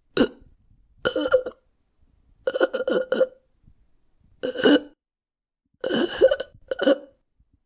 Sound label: gasp; outfly